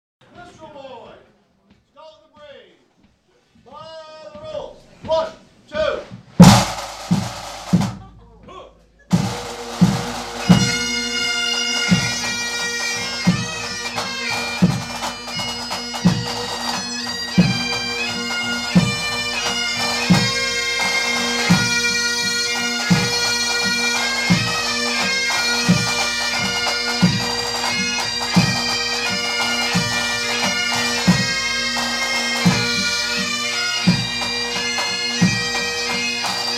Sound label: Pipes Minstrel Boy